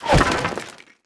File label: ballista hit siege